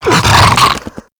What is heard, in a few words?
Infested Ancient Run Breathe, Enemies; Warframe